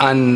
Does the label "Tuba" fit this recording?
No